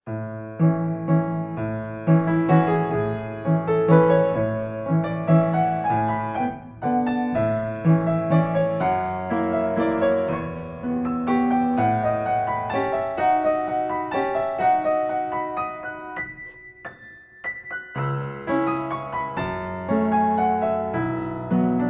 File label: valse for children; Serge Prokofieff